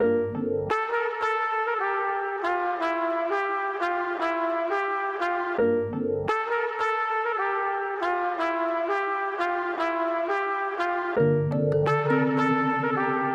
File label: Funk, key of C 86bpm